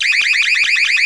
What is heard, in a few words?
alarm